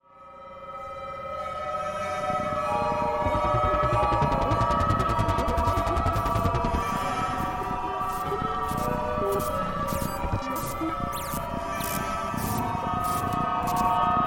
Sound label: synth